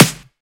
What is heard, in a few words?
short snare drum sound f key, Royality free acoustic snare sample tuned to the F note.